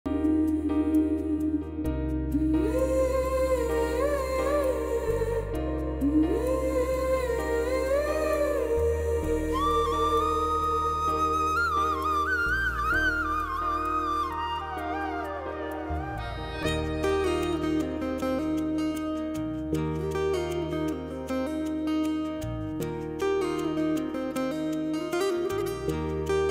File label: BGM ringtone; sunyaara ost